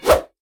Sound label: fire, combat, weapons, swingable; default swingable